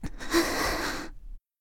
Breathing

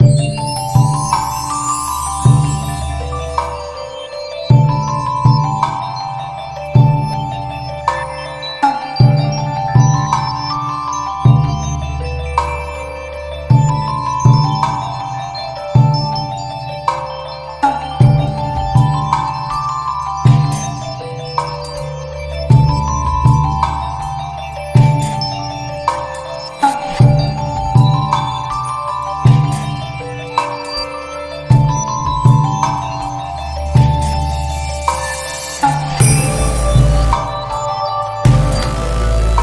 spot sanctuary

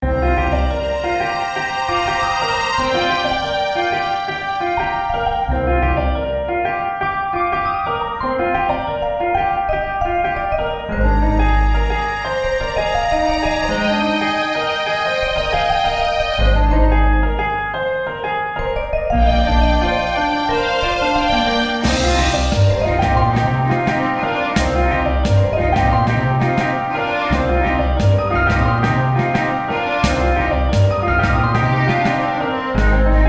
Dungeon

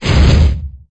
big bang; LiveOrDie

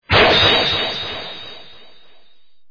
Attack

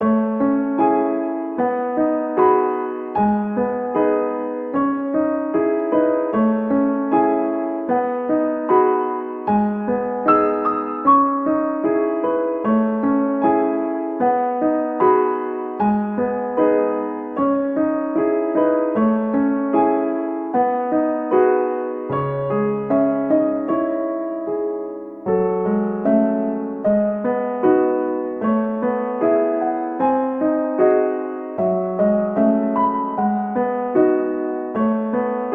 Piano

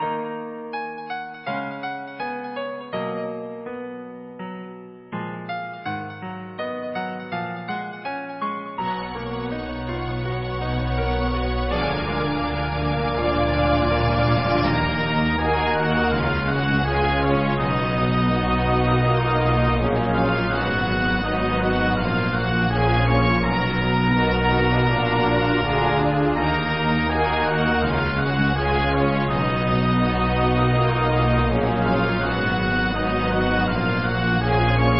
bgm

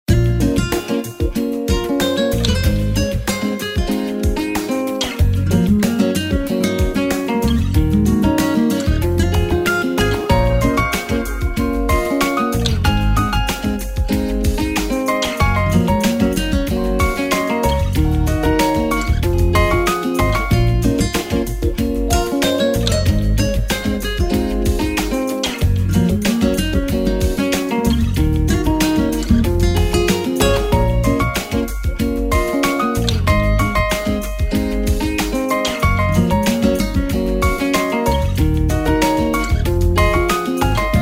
music scene city

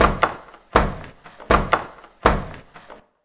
Move

